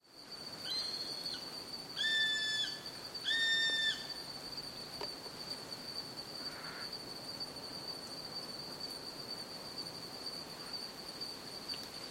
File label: Hawaiian Hawk, songs